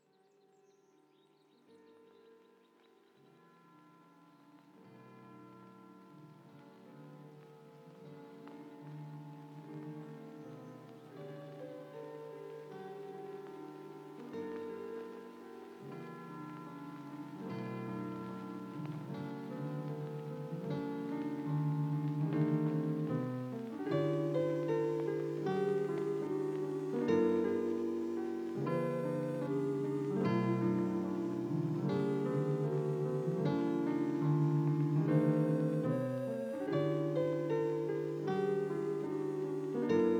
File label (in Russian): muzyka dlya sna malysha detskaya spokojnaya kolybelnaya muzyka dlya sna malyshej